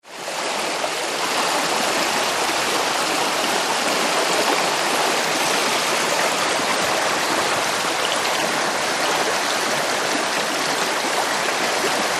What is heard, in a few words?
Stream Rush Water Sur, streams